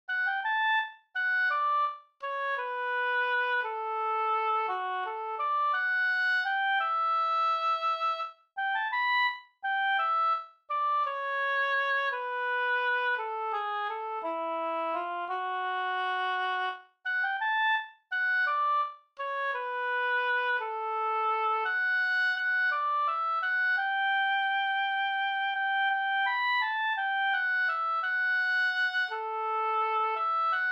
vals valssi

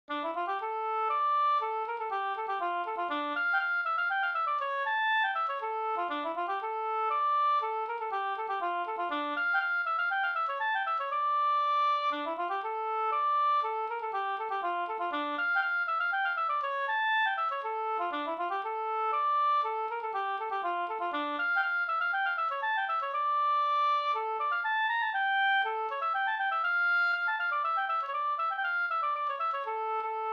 polska Bingsjopolska

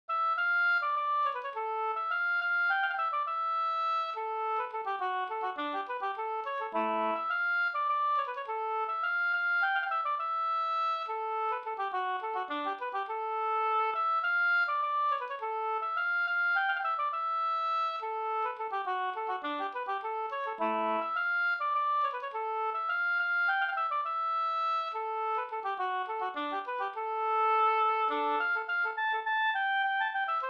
polska Bingsjopolska

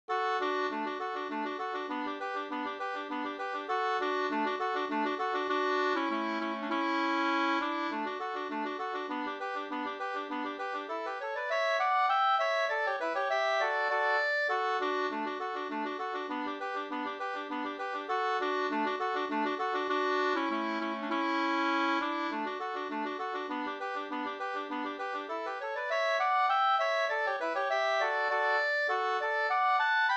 slangpolska storpolska 2st

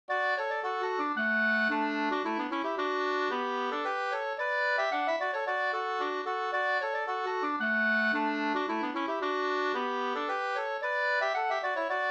slangpolska 2st

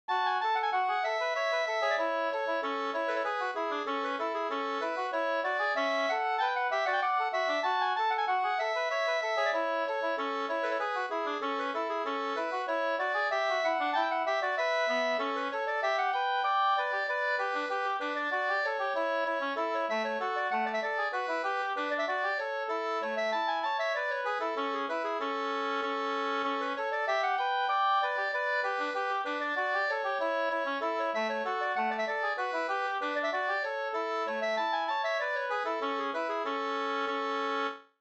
slangpolska

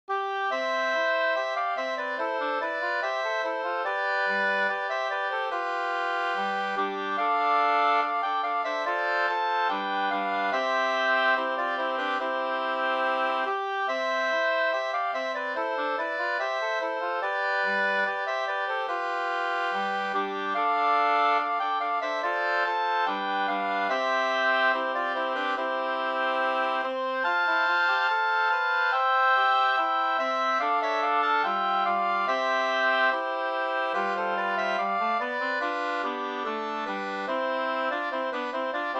brudmarsch, ganglat; Bjurakers brudmarsch Forsa

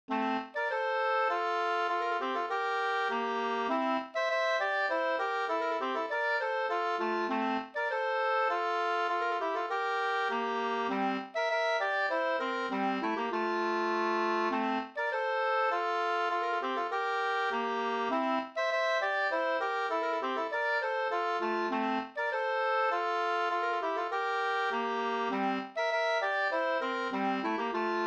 polska Kyrkpolska

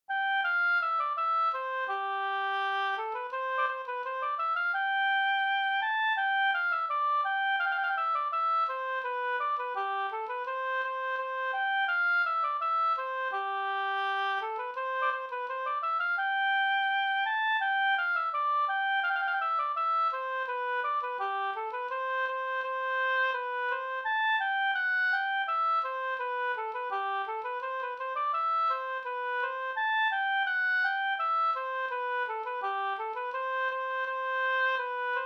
brudmarsch

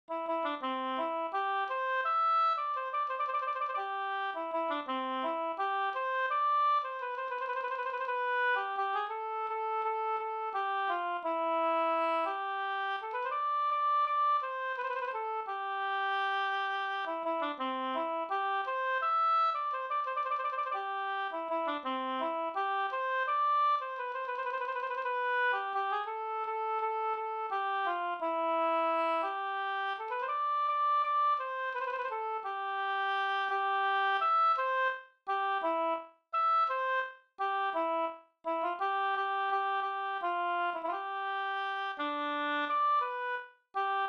vals

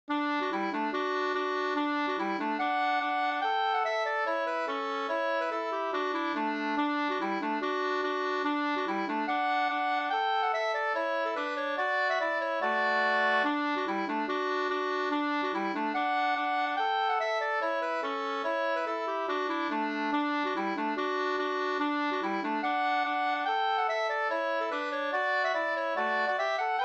reinlender

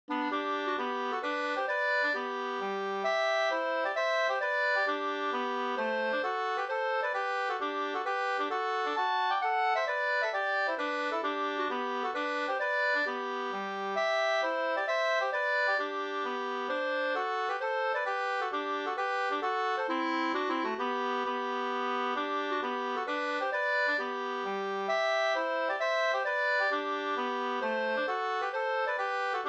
hambopolska, 2st

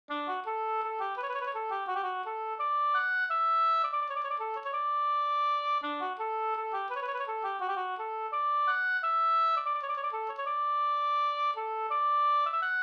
skanklat

